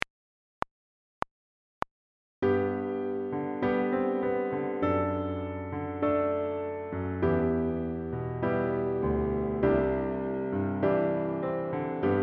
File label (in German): harmonie schema; praxis spieltechnik